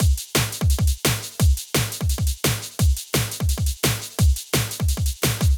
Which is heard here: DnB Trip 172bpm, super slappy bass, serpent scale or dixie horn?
DnB Trip 172bpm